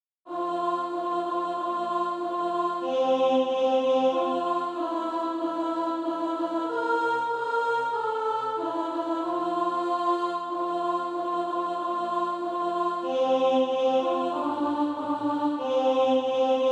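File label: alto, Practise Music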